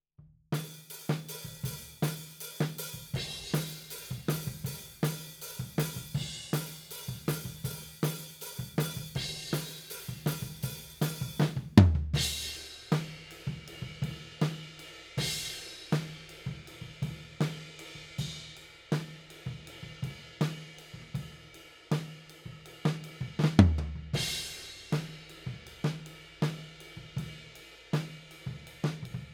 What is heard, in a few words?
Tom, 160 BPM